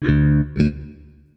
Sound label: D&B Bass Hit; neuro